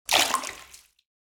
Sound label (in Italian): acqua water, natura